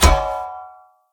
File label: Great Sword Catch; Weapons; Swords; Dendrit Ara Great Sword